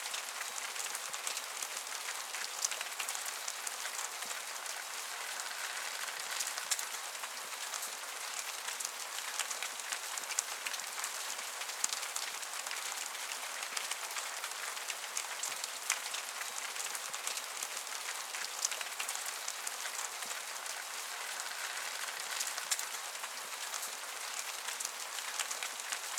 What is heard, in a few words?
Rain Leaves Morning; PQ Pro Sound Effects Library